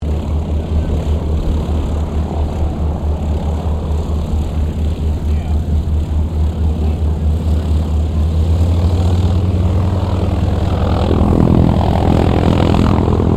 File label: Winjeel squadron 2 shutdown, stereo, 2 Australian Winjeel Trainers; Airplanes; Vehicles